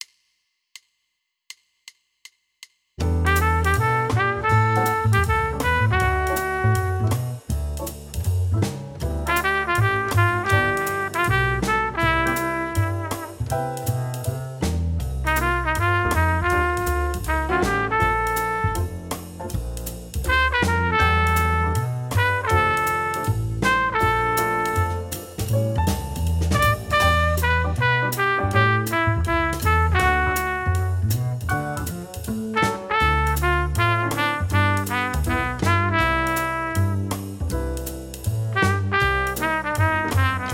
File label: Jazz Etude; Jazz Etude #3 Trumpet and Track